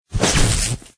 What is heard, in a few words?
bullet create; croods